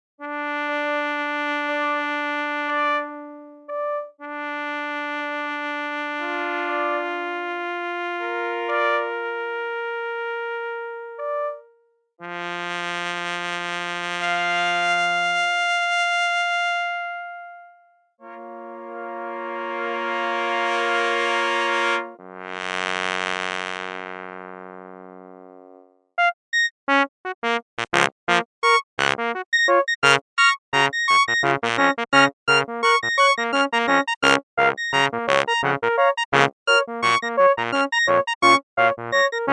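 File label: trumpet, synth; demo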